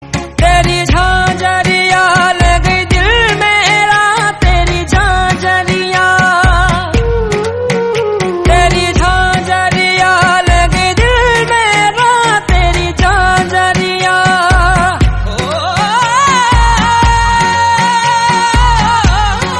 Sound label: Hindi song